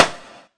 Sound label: hair dryer D1, Hair Dryer Drum Kit; UNUSUAL KITS and FX